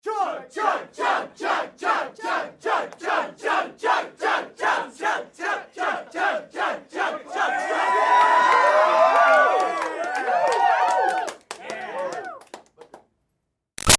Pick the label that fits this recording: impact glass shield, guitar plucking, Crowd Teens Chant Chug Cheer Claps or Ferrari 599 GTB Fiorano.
Crowd Teens Chant Chug Cheer Claps